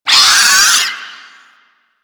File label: Arm Vocal Wind Up; Warframe; Man In The Wall